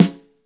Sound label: CHUNKY RIM, snares; InVision Interactive; Lightware VOL-1